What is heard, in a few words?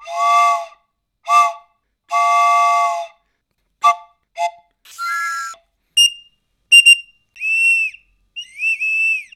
whistle mix, Cartoon; Cartoon - film effects